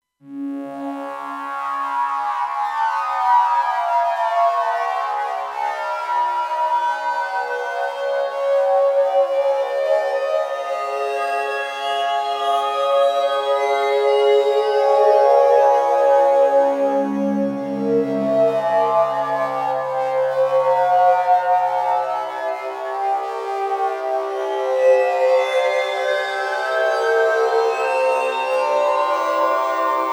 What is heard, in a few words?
Crystalize, PAD